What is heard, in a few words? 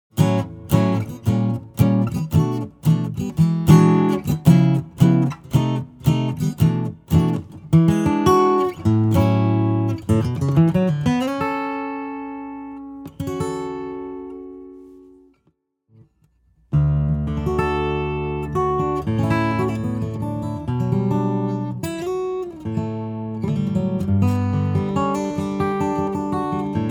gibson hummingbird natural